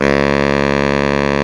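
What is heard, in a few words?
SAX Baritone Sax C#2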